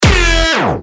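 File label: fan siren sports fans noisemaker; sirena bolelschika shumelka sportivnyh bolelschikov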